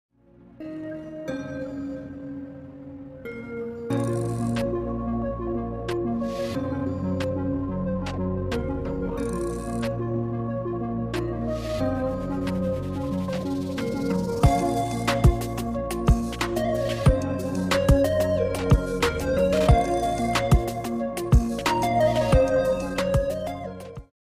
Relax The Dreamy Atmosphere Electronics For Ringtone speedup